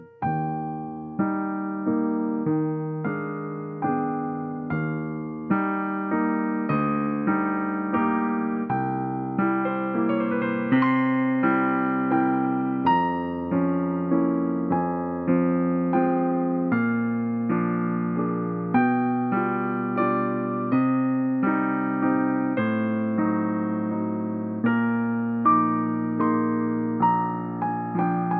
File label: PIANO; CHOPIN